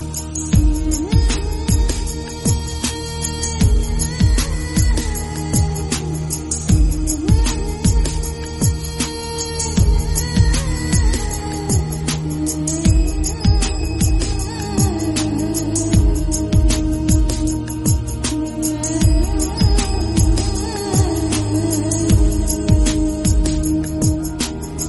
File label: humming bgm